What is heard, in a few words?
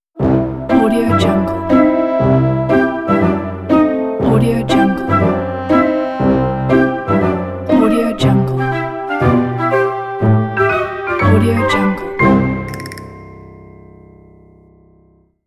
funny little things ident